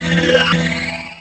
pickup; wo2097

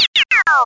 ufo

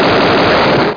enemy fire; asteroids2; asteriodsii

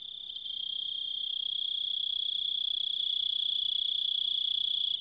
cicada; specops

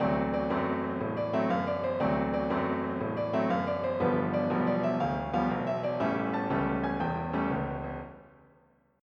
blues

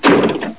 slam; DR BRAIN; BUGS

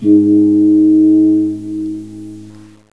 start; Macworld (1997-08); Games World; Shareware Games; Cards; War of Flowers

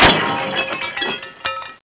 JACKPOT

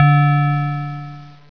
ping; Universe 5.1

